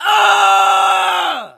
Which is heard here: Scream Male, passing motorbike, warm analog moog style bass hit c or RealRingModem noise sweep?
Scream Male